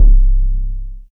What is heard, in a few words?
DRM BASS C, SYNTH, Trance; AMG; Global Trance Mission VOL-2